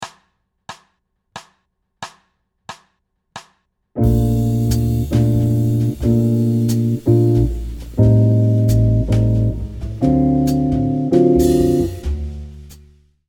Chords

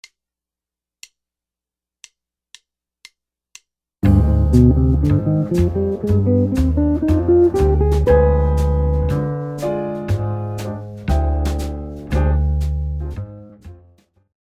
g phrygian dominant scale, guitar

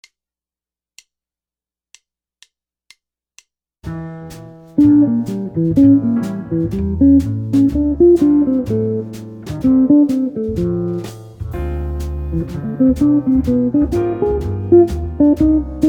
minor ii V I arpeggios, guitar

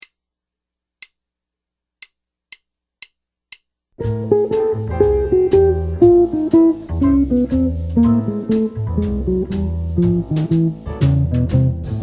arpeggio enclosures, guitar

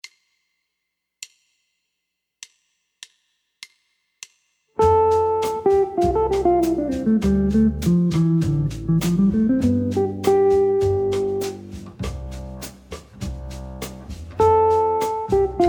lick

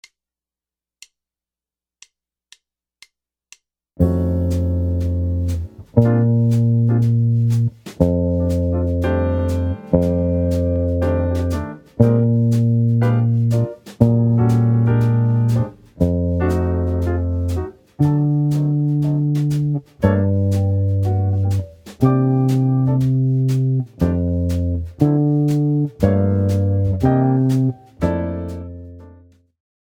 guitar walking bass

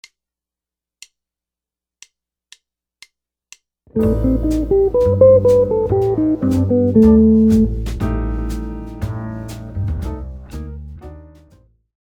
melodic minor licks, guitar

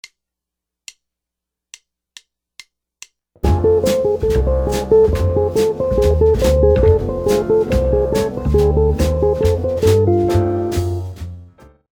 licks

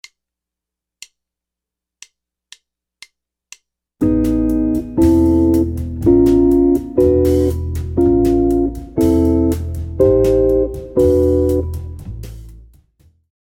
Chords, jazz guitar

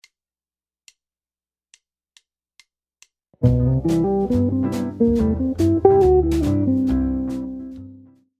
jazz guitar lick minor

